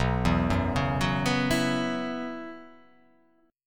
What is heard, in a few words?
B Suspended 2nd Suspended 4th down; arpeggiated; JGuitar